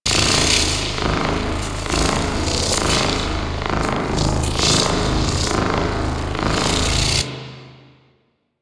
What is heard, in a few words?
Door Machine Loop; Ambience; Props; Warframe; The New War; Zariman; Quests